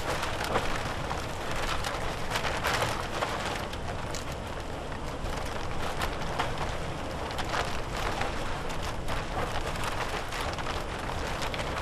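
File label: tarp movement plastic close; Titanfall 2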